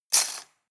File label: cutlery plastic multiple drawer place hard